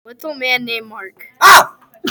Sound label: ahhhhhh